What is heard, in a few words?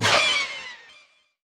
Giant Kiwi Die; lethal company